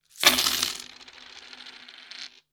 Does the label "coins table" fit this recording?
Yes